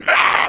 stalker pain; npc; Half Life 2; Episode 1

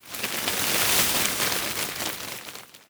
foliage react explosion

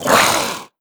bold hiss, headcrab; creatures; headcrab classic; hideout

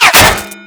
bullet impact bot; Portal 2

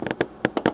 plastic box strain; physics

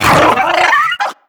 headcrab reviver vox; creatures

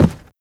step run, footsteps cardboard

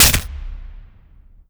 bolt huge blast

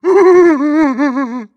breath long, zombie; creatures

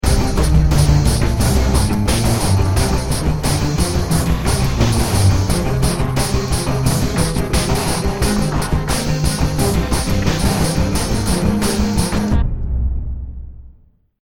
start action, music

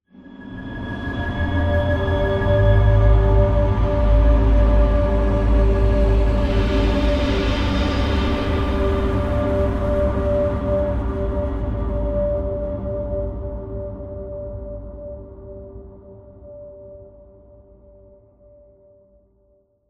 amb unique headcrabs; Half Life Alyx

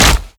step close, zombie blind; Half Life Alyx; creatures

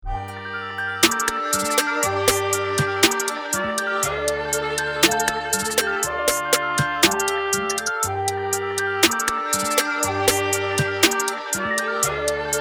start round, music

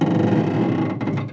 metal stress

